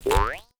bounce; fallguys

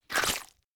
jump slippery; fallguys

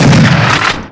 shotgun; ragemap2023

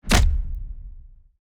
fruit hit; fallguys

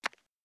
footstep plastic; fallguys

